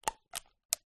rubber band around rolled up news paper movement light; foley